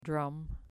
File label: drum